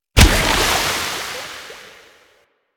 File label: Undertow Corrosive; Warframe; Warframes; Pirate